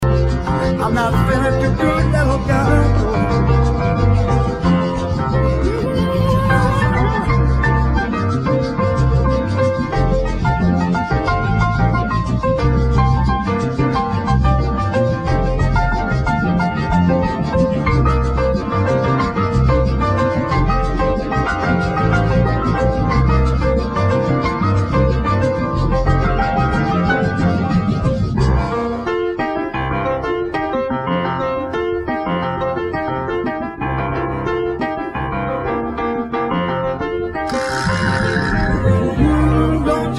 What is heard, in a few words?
(Solo & Break-RealTime)